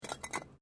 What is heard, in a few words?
EARTH MOVEMENT MINOR, Debris; Material components for constructing more complex sounds; Materials